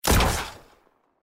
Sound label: Sniper shot